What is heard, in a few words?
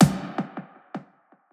percussion one shot; power; Slate; SD PASADENA Pop Sample Pack